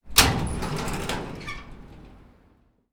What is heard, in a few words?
Garage Door Opening